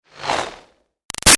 Arrow By Ruffles Slow; Bows and Arrows; Sound Effects:Combat; Big Room Sound

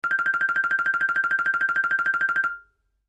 Xylophone Trill; Mallet Sound Effects; Musical; Cartoon; Xylophone Trill High

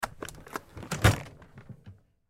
Recliner Chair Recline; Blastwave

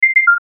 chime beep, Chime Beep Clean Tone Descend

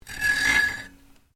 HACKSAW SLOW DRAW, domestic hacksaw; Saws (Sound Effects:Machines)

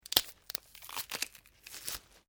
LIVE PLIABLE SNAP RIP, Organic Foley sounds; Injuries; Combat

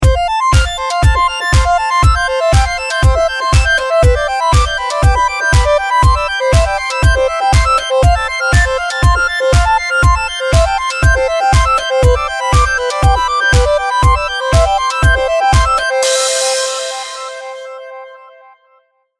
Audio Logo Music; Musical Sound Effects

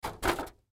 Styrofoam Set Down, Styrofoam Set Down Fast Tray; Foley; Objects; Blastwave

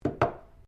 JAR GLASS PLACE BENCH; Various kitchen jars; Set Down Sound Effects; Set Downs (Sound Effects:Objects)

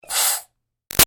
Steam Release Iron Chuff Hiss; Miscellaneous Household; Big Room Sound

